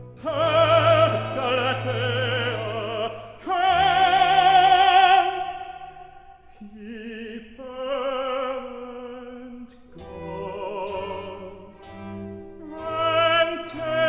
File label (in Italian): Recitativo; Help, Galatea; Handel Masterworks